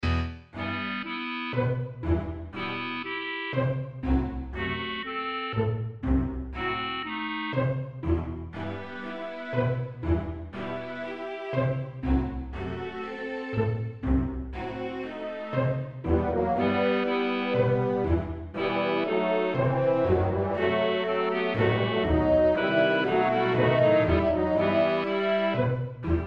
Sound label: Midi; township venice 3d